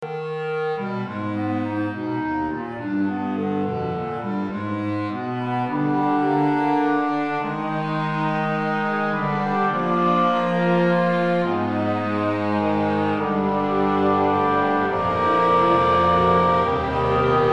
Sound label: Bassoon; III. Allegro; Martin Braun is a composer and neurobiologist.